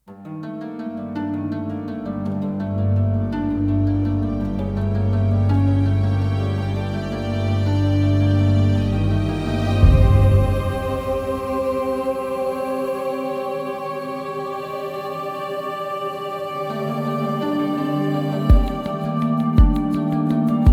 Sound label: suspense music cue